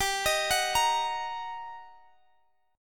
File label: G Minor Major 7th Sharp 5th G, Guitar, Drop D; chordshape; down